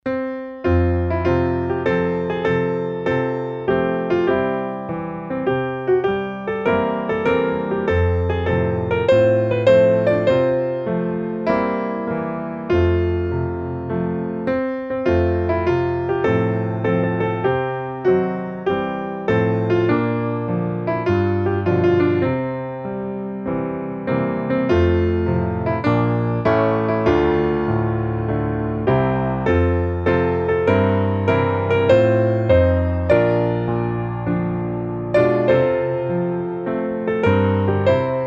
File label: piano